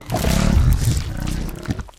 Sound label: flesh eat, monsters